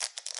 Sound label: electric